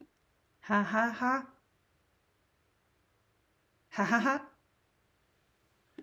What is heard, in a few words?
Dialogue hahaha